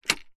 household telephone vintage rotary style pick up receiver; zapsplat